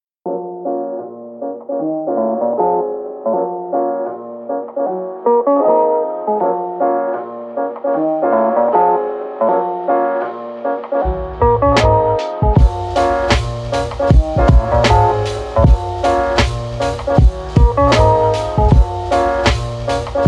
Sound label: Elevator Jazz